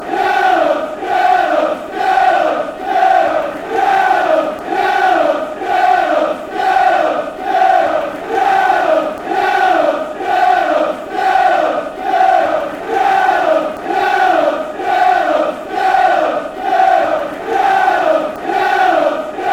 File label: fanchants; yellows southampton